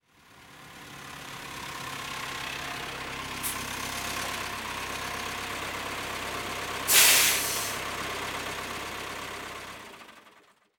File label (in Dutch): Busstop met uitloop